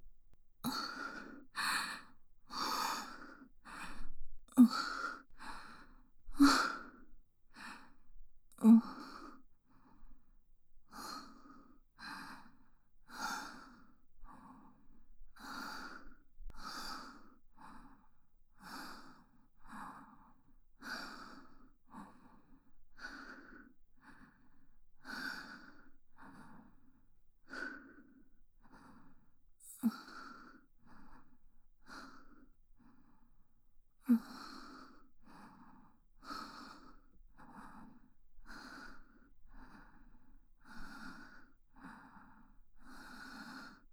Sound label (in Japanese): 吐息